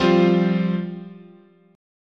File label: F Suspended 2nd, chord